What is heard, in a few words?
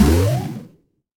retro game jump